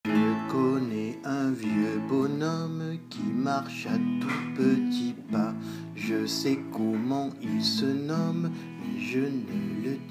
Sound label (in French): Guitare